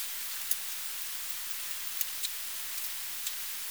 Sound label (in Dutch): unknown social, vleermuis